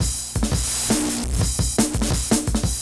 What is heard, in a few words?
bitkit, Breaks; neuro; Sinistarr